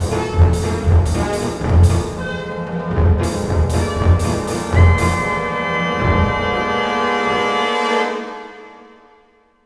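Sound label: fairground 116; Orchestral Loops; Best Service ProSamples vol.33; AKAI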